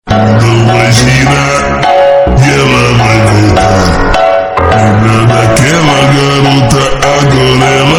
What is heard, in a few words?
phonk